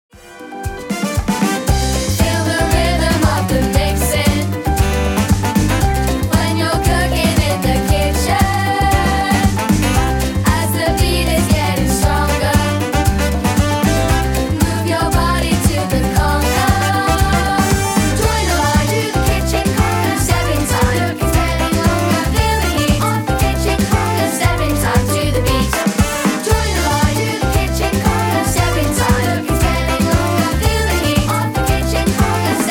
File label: kitchen conga